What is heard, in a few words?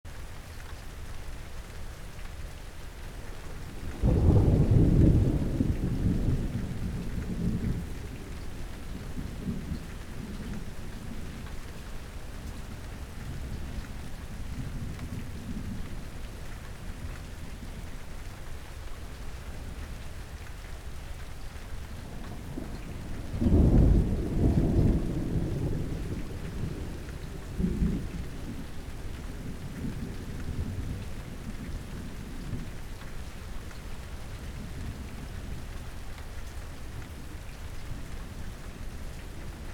calm comfort rest thunder